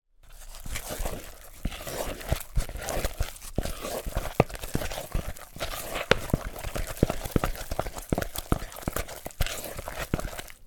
mixing mixture cake or dough in plastic bowl with wooden spoon